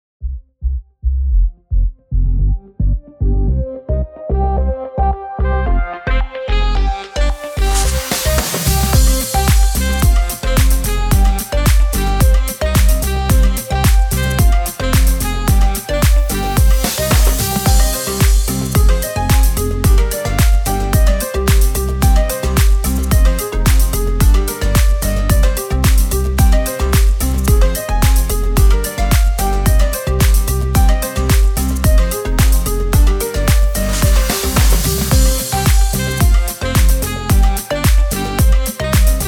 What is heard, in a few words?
background music for video funny dance house